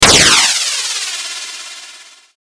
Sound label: launch; sfxr